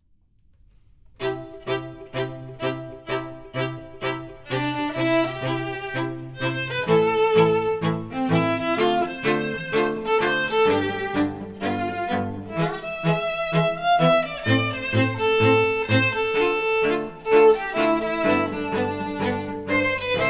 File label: Trio